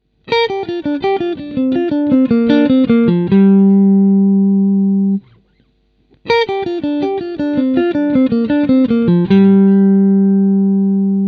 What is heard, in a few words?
Minor Pentatonic Lick, guitar